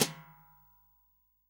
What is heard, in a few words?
SD, REAL DRUMS, SWING KIT